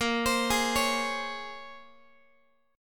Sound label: A sharp Minor 9th, A sharp, down, Guitar; A#m9 arpeggiated; x,18,15,18,17,x